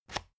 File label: spring binder unlock